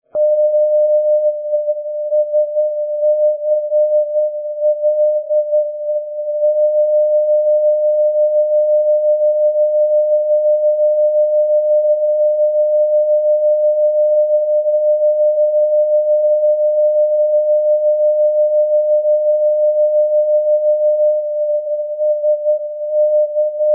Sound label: ndb apt saint christol scl 348; europe